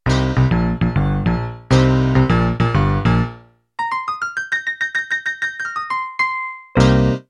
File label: Country Shuffle End